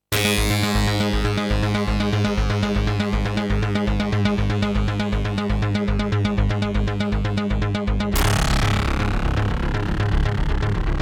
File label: psy Trance SEQ